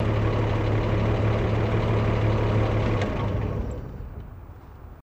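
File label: Chevy Truck 46 Idle Off In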